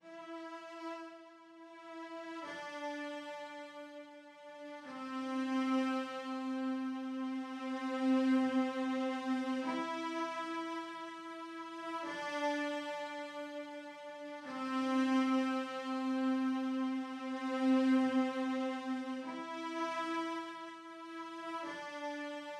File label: cello strings film eq